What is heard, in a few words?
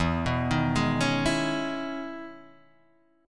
E Major 13th E down, Guitar; EM13 arpeggiated; Standard; JGuitar